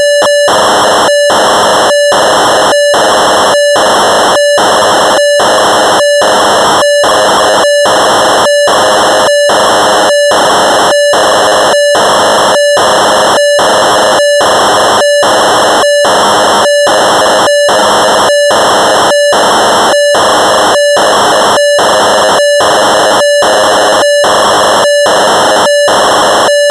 Rhodes Chroma factory presets, Cassette Interface; Synthesizer Factory Patches

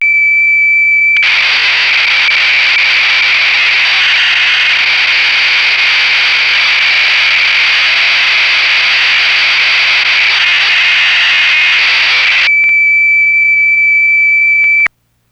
Oberheim DSX Diagnostic, Cassette Interface; Synthesizer Factory Patches

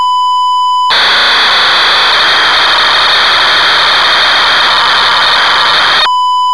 Roland Jupiter 6 factory presets; Synthesizer Factory Patches

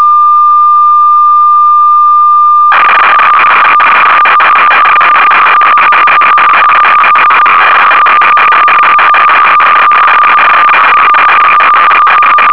Oberheim OB8 factory presets, Cassette Interface; Synthesizer Factory Patches

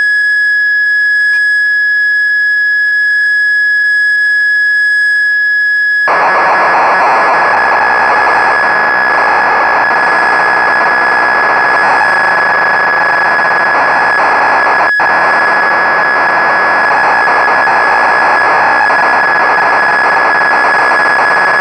Moog Memorymoog factory seq; Synthesizer Factory Patches; Cassette Interface

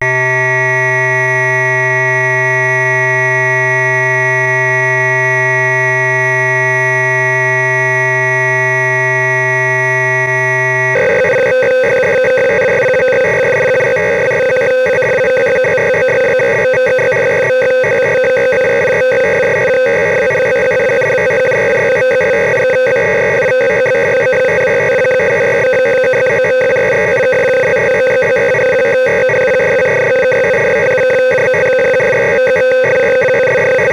Sequential Circuits Prophet 5 factory presets; Cassette Interface; Synthesizer Factory Patches

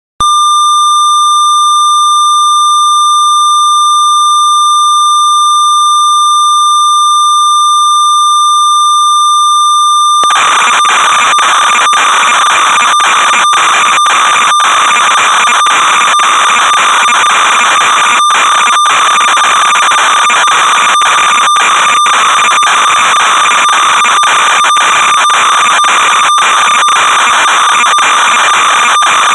Oberheim matrix 6 factory presets; Synthesizer Factory Patches; Cassette Interface

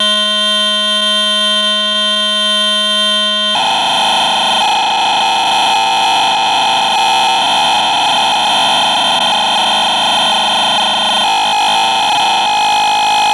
Sequential Circuits DrumTracks factory presets; Cassette Interface; Synthesizer Factory Patches

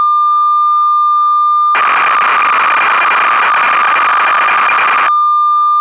Oberheim OBX factory presets; Synthesizer Factory Patches; Cassette Interface

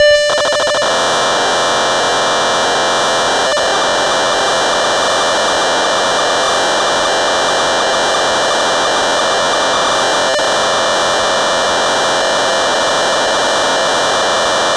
Rhodes Polaris seq, Cassette Interface; Synthesizer Factory Patches

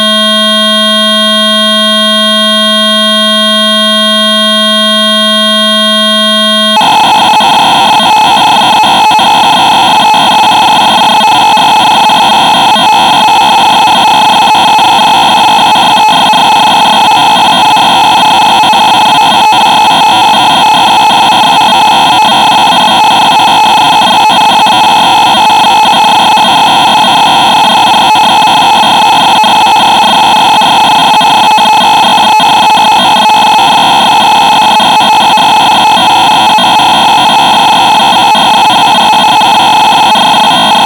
Sequential Circuits Prophet T8 factory presets; Cassette Interface; Synthesizer Factory Patches

